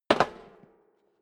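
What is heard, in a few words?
step boots metal rattle jump, footsteps; schritte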